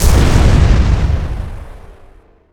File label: space explo; Quake 4 Alpha; vehicles; strogg flyer